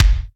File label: rich top end kick drum G key